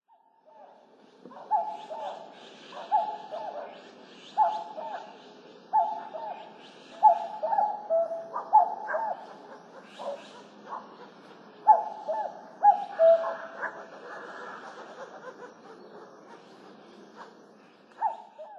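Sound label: kakko, Common Cuckoo; utsunomiya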